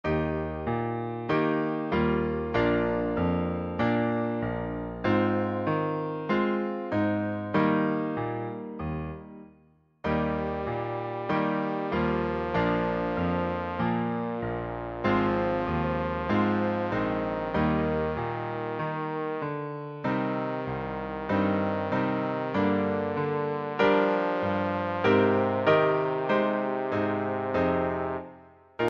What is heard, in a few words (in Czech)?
trombon klavir; Přednesová skladba pro trombón; Stíny minulosti - trombón